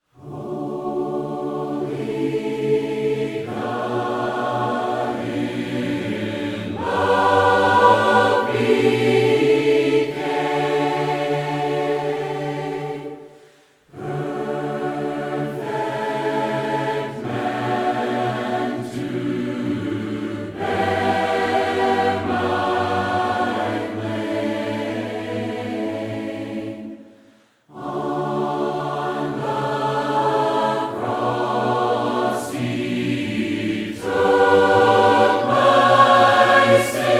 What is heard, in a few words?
gospel song